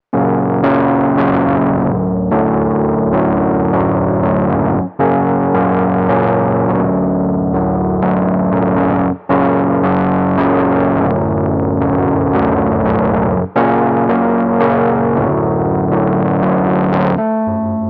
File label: distorted sustain; A play with distortion and a little minor key. (2010)